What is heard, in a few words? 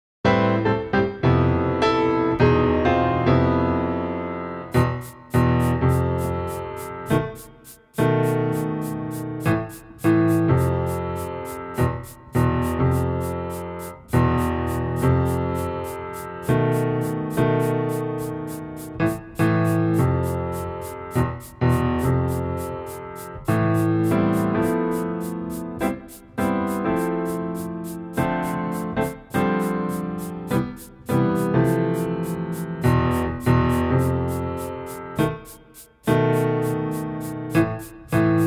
improv track